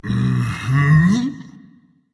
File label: agree berserker; cuchulainn